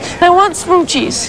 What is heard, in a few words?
Smooches; buffy